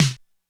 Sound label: Snare, Korg DSS-1